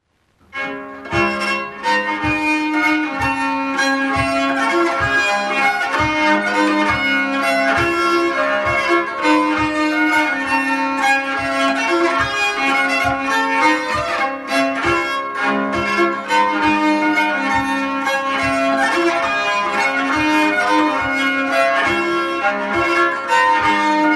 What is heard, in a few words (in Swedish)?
Nyckelharpan